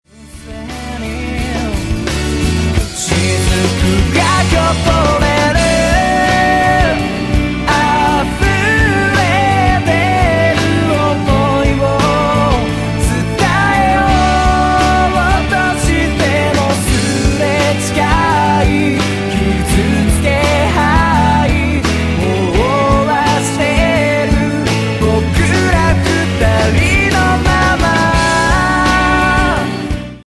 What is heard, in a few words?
drop